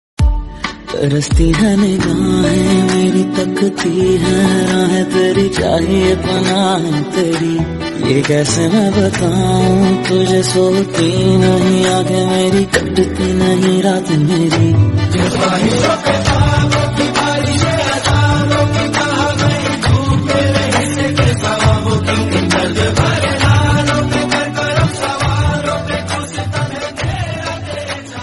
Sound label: love song hindi